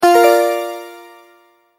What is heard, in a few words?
multimedia tone start